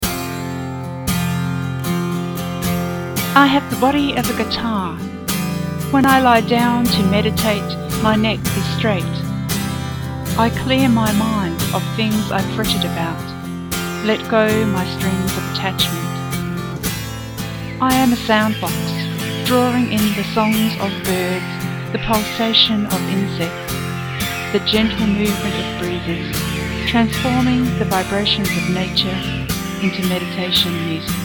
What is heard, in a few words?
guitar; I have the body of a guitar